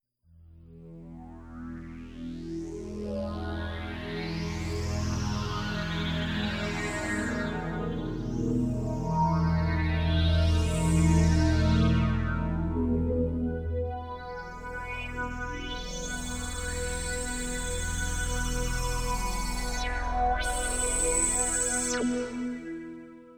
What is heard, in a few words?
drone orch; chromaris